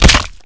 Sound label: demon attack; warlock